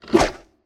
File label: arrow release and swoosh